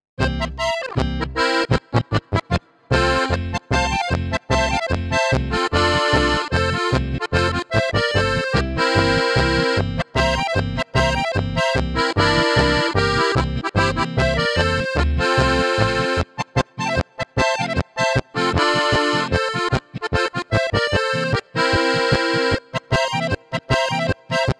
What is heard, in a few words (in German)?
ZUPAN Alpe III Diskant